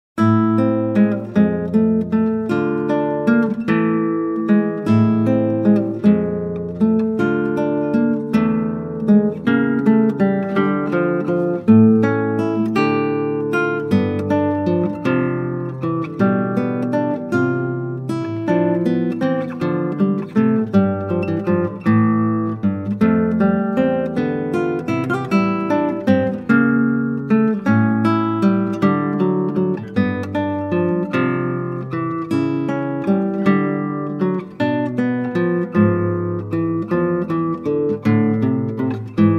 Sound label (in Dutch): Klassieke Gitaar; Bach 50 Solos